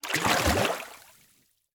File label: Liquid Water Water Wave Splash Single